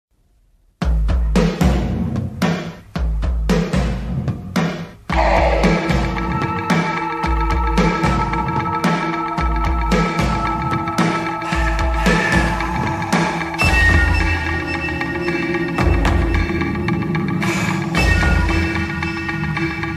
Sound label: club mix; howling 2 my sister s a werewolf